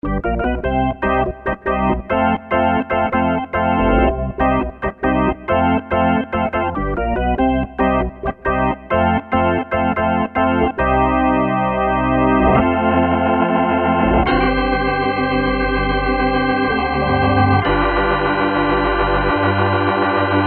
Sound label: Perc Organ